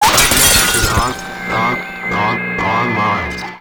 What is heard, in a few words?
Screen Blast; Warframe; Ambience; NineteenNinetyNine